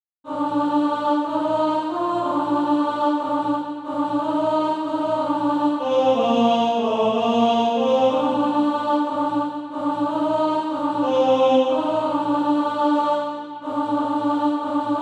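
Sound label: alto, Practise Music; Cradled All Lowly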